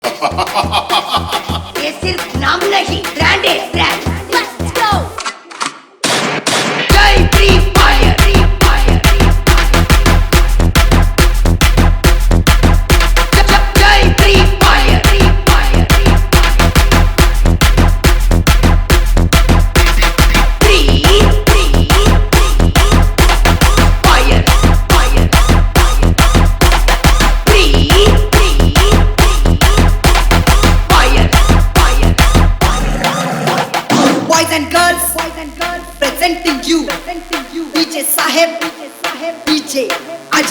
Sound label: Edm Drop Dance Trance Remix; Compitition Dj Mix Songs